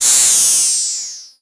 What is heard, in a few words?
Multimedia Internet CD-Rom Flash Wipe Electronic